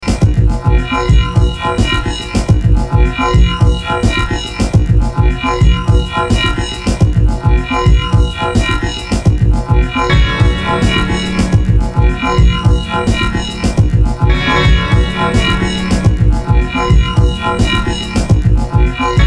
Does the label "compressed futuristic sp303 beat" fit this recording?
Yes